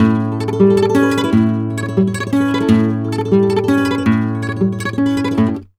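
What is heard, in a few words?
guitar, TREMOLOS, Flamenco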